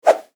swoosh sound

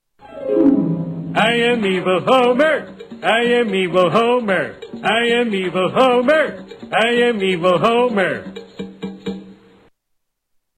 song; evil homer

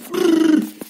loop march

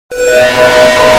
samsung bass boosted reverb

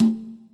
low conga

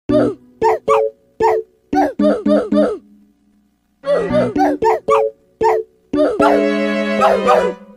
theme but its oofed by roblox death sound; mii channel

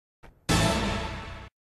special trick sound effect; tony hawk

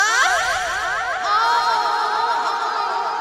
moan

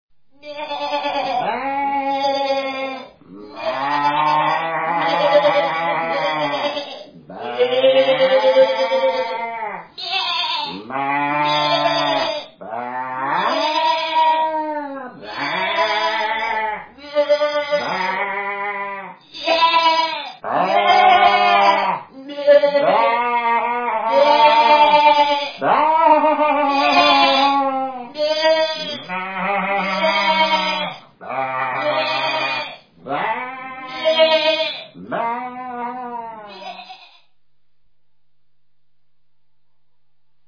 cartoon animals comedy assorted sheep bleating

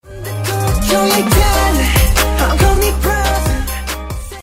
airplane

